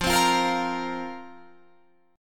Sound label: F Suspended 4th F down Guitar Open F; Fsus4; strummed